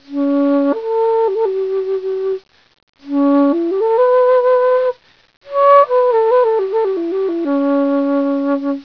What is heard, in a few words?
flute